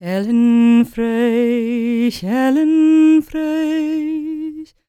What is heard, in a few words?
GAELIC, World Voices, GAELIC FEM